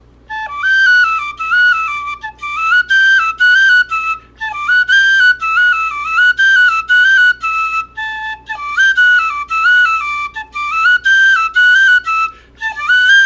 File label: Jig; Almonte Cetfest